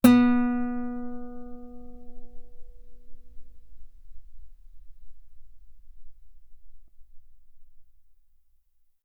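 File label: Guitar ff B string B3; OmniPower4296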